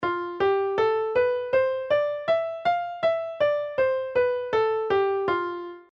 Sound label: F lydian